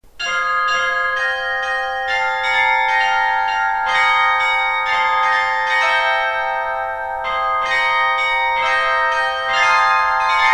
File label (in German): 13 Glocken Grassmayr Glockenspiel